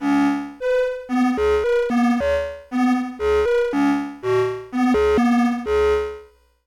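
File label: keys flute overdrive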